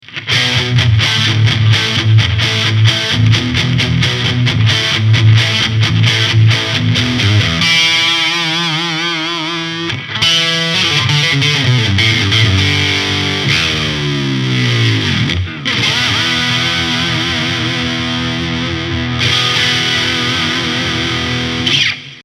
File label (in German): Steg verzerrt Singlecoil; Ibanez RG5320